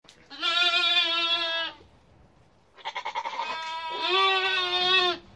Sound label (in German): Ouessants mähen